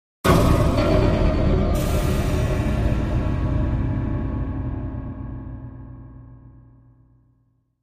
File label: Elect Hit Ethereal, electronic hit